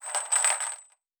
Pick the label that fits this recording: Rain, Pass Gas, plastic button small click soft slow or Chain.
Chain